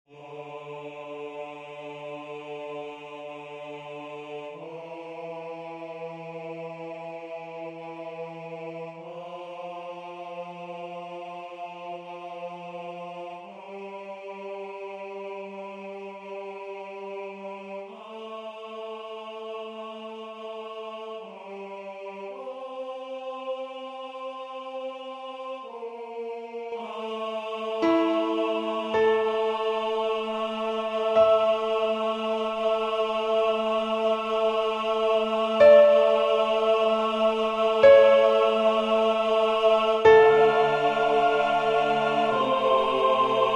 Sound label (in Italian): contralto piano su voce